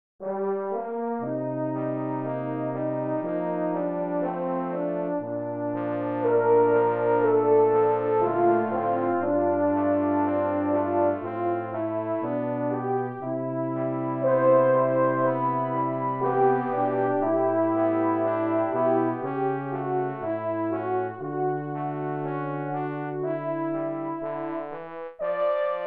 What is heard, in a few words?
short Love Theme brass quintet